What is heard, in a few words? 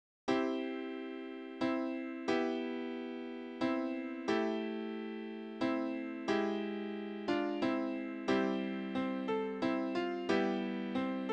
easy piano; Hymn Arrangements for the LDS Pianist